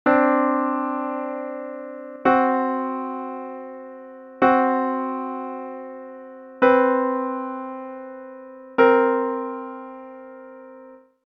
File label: Disonance